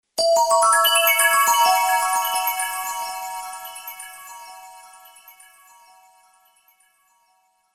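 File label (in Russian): zvuk volshebstva, magic sound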